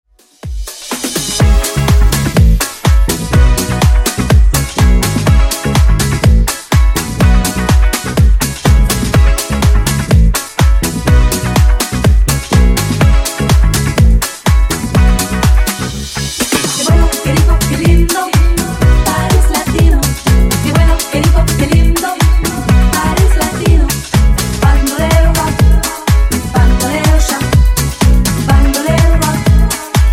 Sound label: Latino Funk Nu Disko 124 Bpm